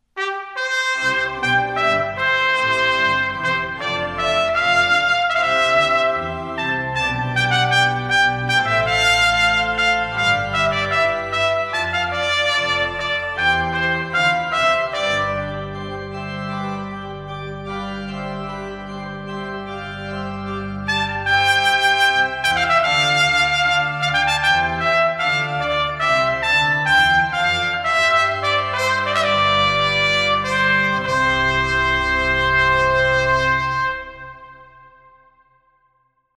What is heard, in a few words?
Trumpet tune; Stanley